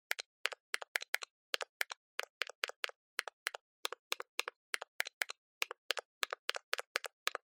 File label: Cell Phone Hardware Button